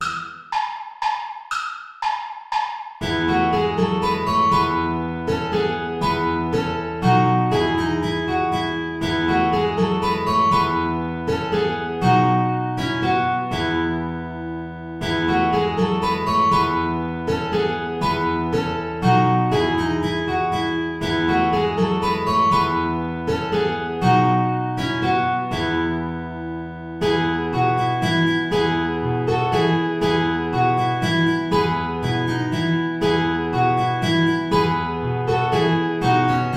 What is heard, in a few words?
saltarello play along without guitar; praetorius michael